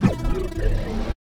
punch left slow down super hard; Pitch Black - A Detective's Demise

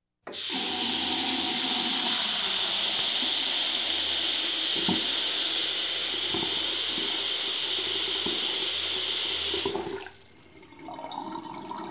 Household Phones

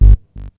Menu Synth Tone

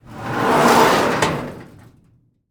Morgue Drawer Slide; Medical; Blastwave

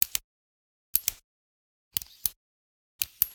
Generic Click Metal Small

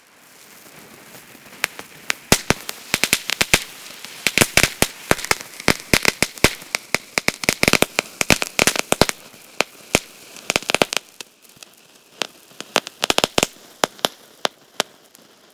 firework; best service Blue Box

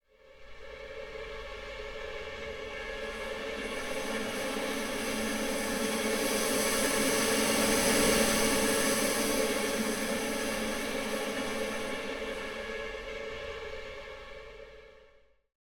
Symphonic Pad Up & Down Tension Pad; Hollywood Edge; sound designer's toolkit v2

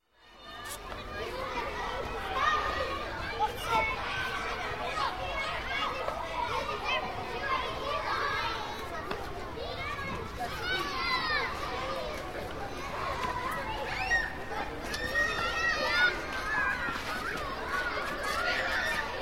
Children Schoolyard - Playing And Yelling; Ambience; Sound Ideas 2000 Series

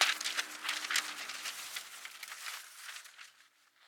Paper Rustle; SFX Kit; Foley - Misc

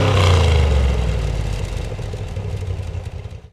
65 Corvette Rev Down, Automobiles

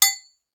Sword Hit; Weapons; 1001 Sound Effects

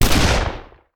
Rifle; Sound Ideas; Guns

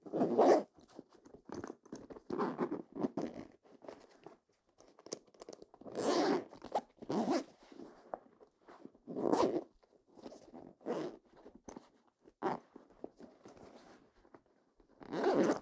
backpack zip up; human; NS Studios - ultra sound collection

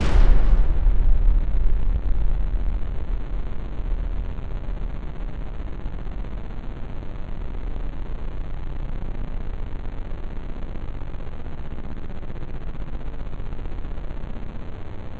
SCIFI Metal Claw; Cinematic Metal Impacts

